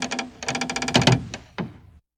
otwarcie wieka trumny

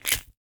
wsadzanie czaszek na kamertony; apocalipsis

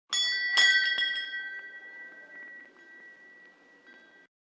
dzwon

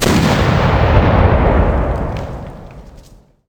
wybuch, armata z oddali

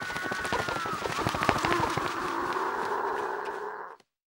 Ptak wylatuje z paszczy smoka; apocalipsis

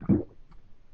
polykanie ptakobestia; apocalipsis

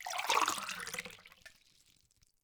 nalewanie do kielicha

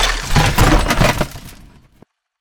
sople spadaja

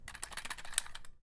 przekladanie liter, kolo fortuny

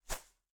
Chwytanie przedmiotow

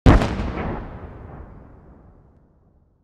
wybuch

